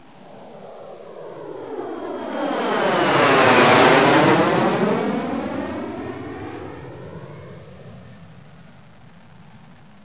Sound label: jet